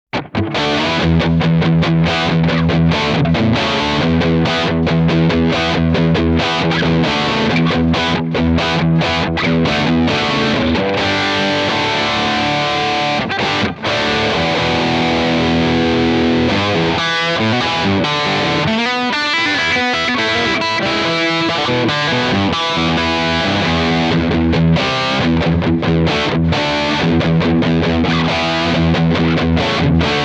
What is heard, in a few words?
Positive Grid Spark 40 High Gain